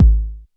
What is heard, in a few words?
K909, KICKS TR 9+8